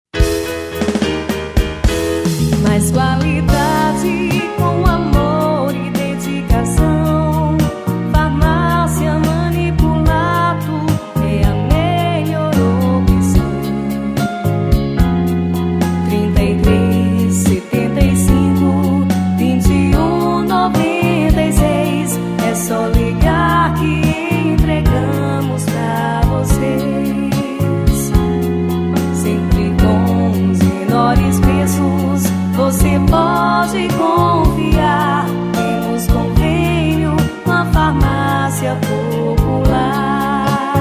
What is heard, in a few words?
JINGLE; FARMÁCIA MANIPULATO